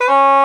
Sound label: MULTI, SOPRNO SX